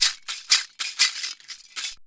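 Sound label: maraka groove; Best Service; World Colours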